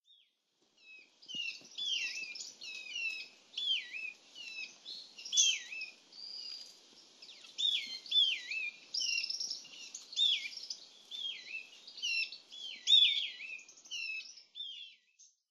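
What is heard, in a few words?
Bonin White-eye; meguro; hahajima; koe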